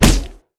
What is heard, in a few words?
yeti vs flesh; nunu